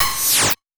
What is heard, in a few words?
Power Down, Sci-Fi Systems; David Dumais Audio